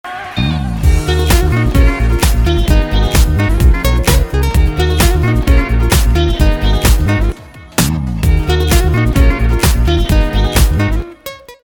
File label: Motorola Razr Official Ringtone